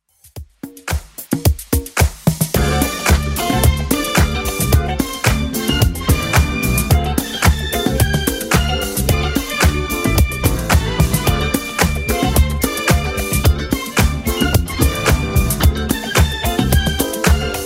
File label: 70s Club 110 bpm CLEAN